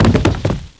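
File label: large, impact explosion; SINDEMO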